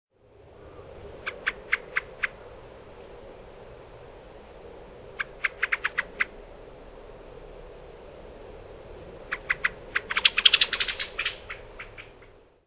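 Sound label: pale thrush, hyogo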